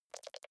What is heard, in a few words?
click erratic wide; signale